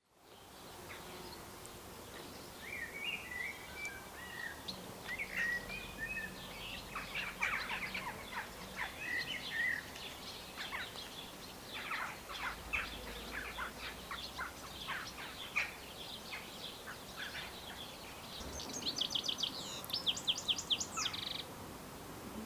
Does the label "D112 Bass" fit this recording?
No